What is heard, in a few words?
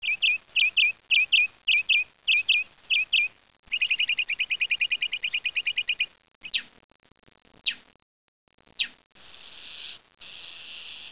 mockingbird, songs